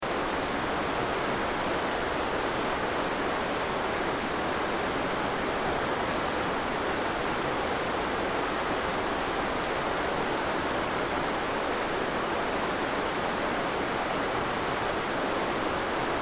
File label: River Ambience Sony Internal Mic